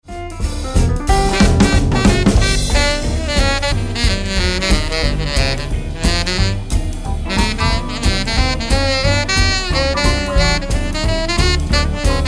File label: sax